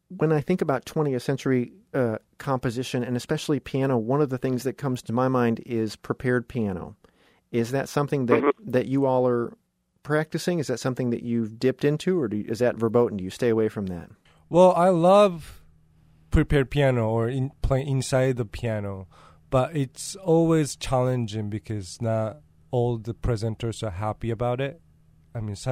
prepared piano

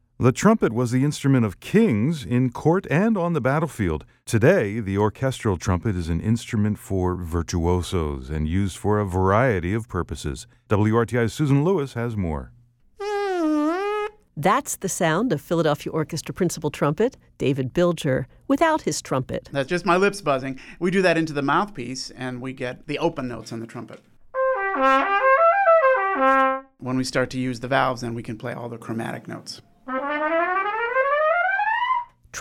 Trumpet

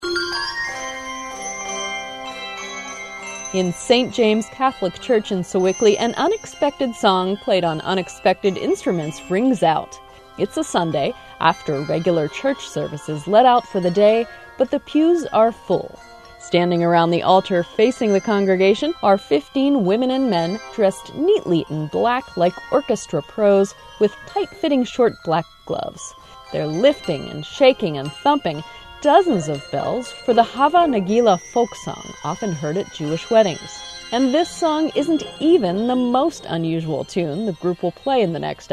handbells; bells fade

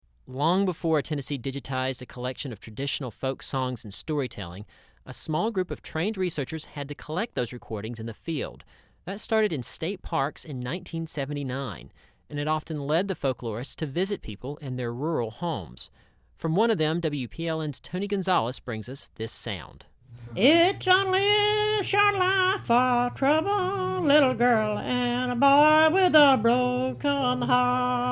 folk ballad

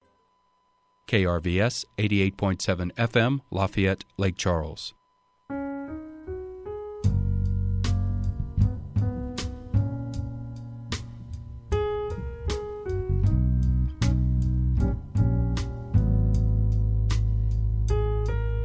jazz

